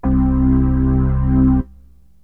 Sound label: ORGAN; AMG Megabass REMIX! VOL-2